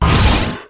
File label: spawn single